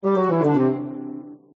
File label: goals failed; gardenbloom